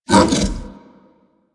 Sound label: Interact Bark Speak, Kubrow; Warframe; Tame